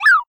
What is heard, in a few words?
jump